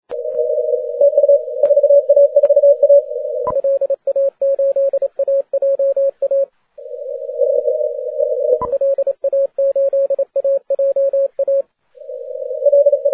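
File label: 15m continuous wave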